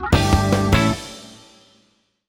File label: Low Win; WildWater